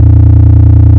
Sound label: synth